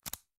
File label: Lock; Itel Vision 1